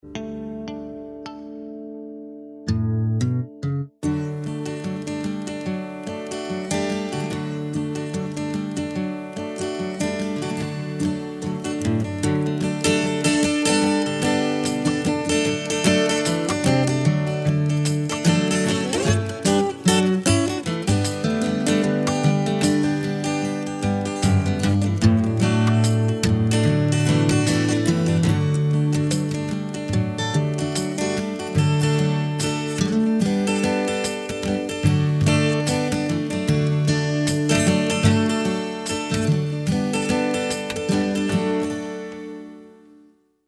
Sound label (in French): UN AIR DE FOLK